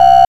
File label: Hard PCM Chip; chiptune